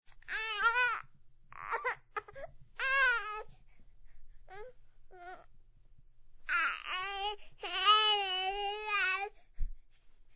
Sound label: baby cry